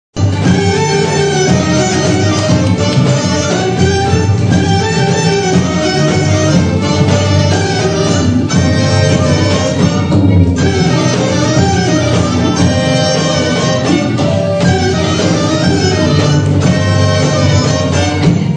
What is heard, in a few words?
ratschenitza